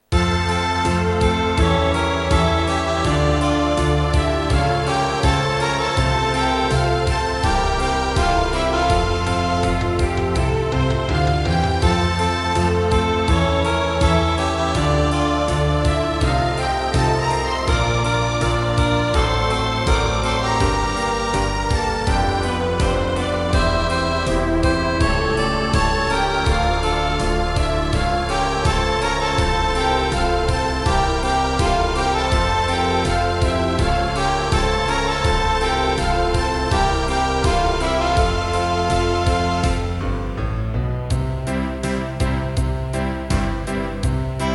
zirkuslieder einmal da heisst es abschied nehmen playback

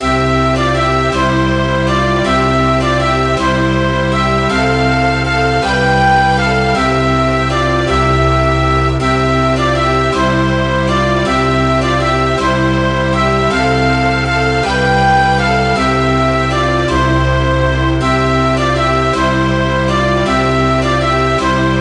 pastorale orchesterfassung

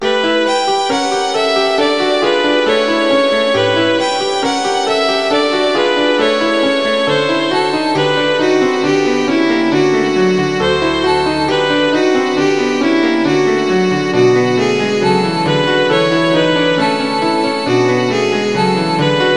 violine u klavier; kinderszenen; bittendes kind

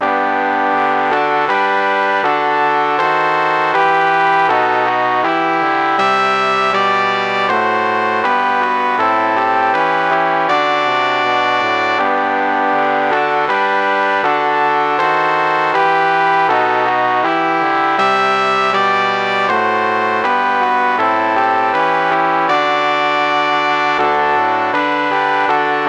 deutsche nationalhymne blechblasquartett

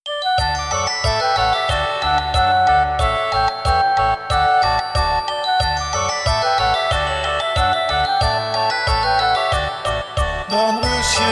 kinderlieder dornroeschen war ein schoenes kind

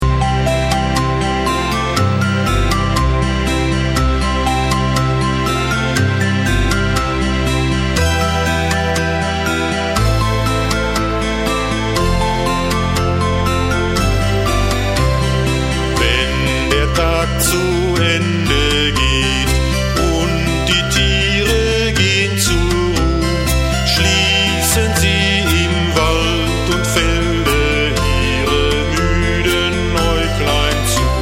kinderlieder abendlied vocal

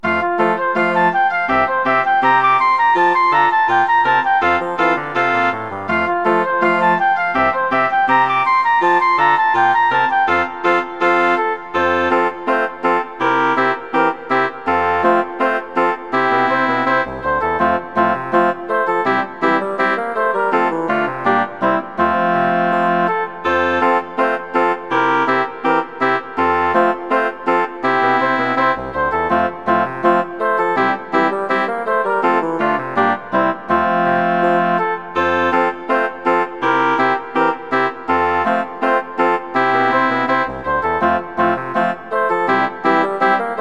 die vogelhochzeit holzblasquintett

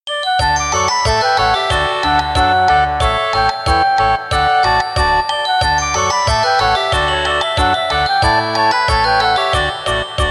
kinderlieder dornroeschen war ein schoenes kind playback

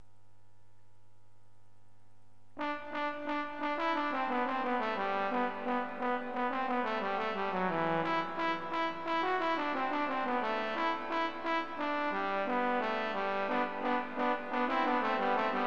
kanon fuer 3 posaunen partitur

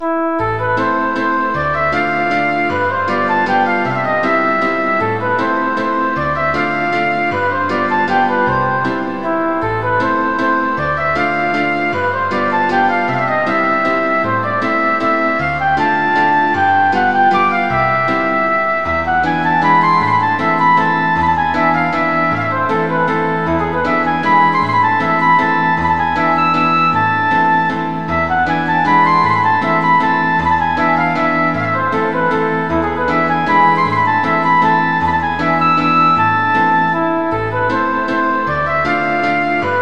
walzer a moll floete u klavier; chopin

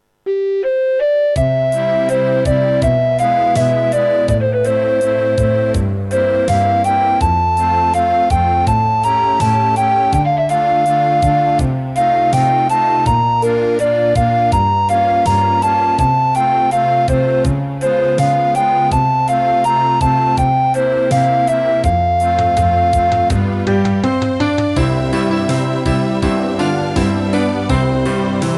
zirkuslieder playback

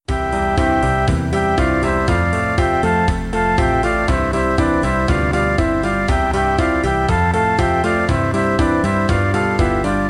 kinderlieder

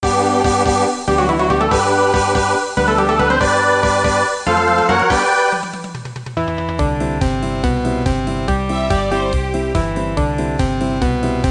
kinderlieder playback

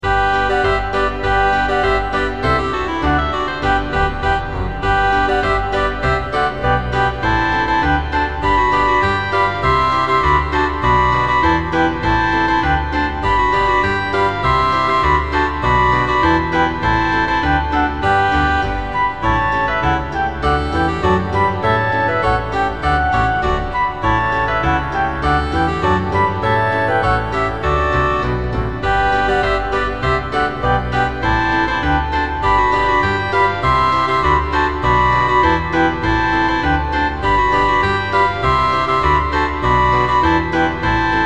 zirkuslieder liebe leute kommt herein strofenlied playback

